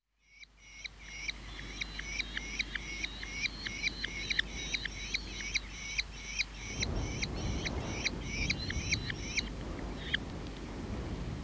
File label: Merlin, birdsong